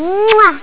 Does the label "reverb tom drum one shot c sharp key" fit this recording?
No